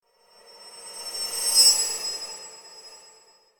Impact Metallic High Squeaky Impact - Passing, Semitone; Hollywood Edge; sound designer's toolkit v2